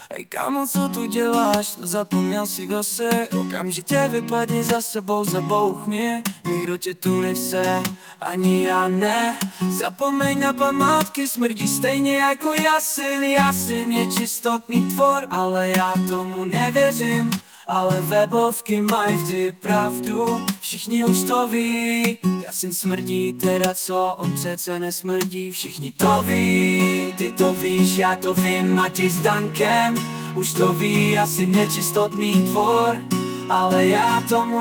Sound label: acoustic